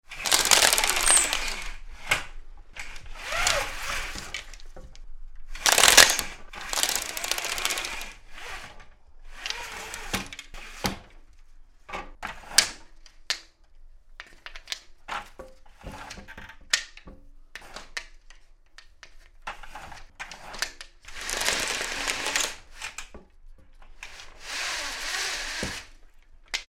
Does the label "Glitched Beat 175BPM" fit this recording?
No